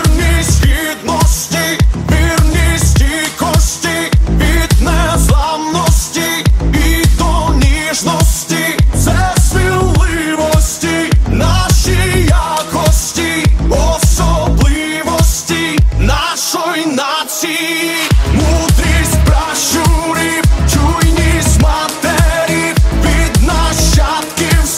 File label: awesome pop song tone ringtone